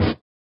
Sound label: SWUSH; Spectrasonics; Metamorphosis